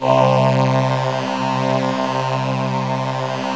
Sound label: VP330